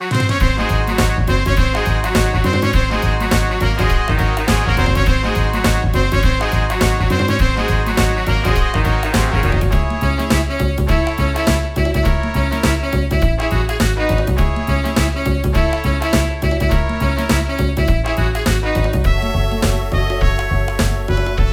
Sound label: Token Tango intro; donkey kong country 2 fan remastered